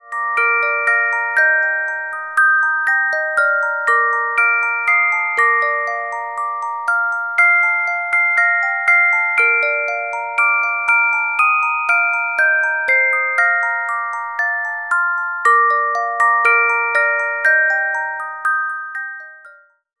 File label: Carillon Music Box Melodies